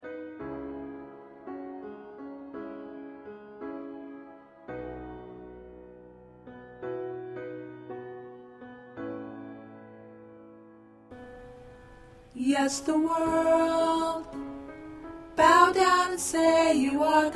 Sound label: Alto, choir